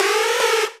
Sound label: Synths; Vengeance Samples